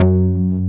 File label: fat bass; Dance; BASS SOUNDS; Masterbits; Dance Trax